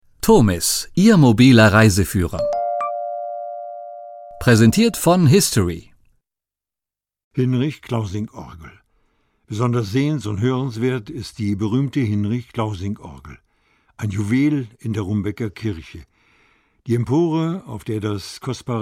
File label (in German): orgel; arnsberg; hinrich klausing